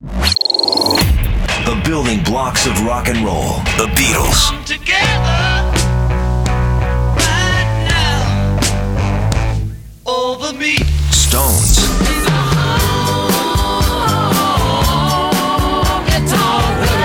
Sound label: Music Promo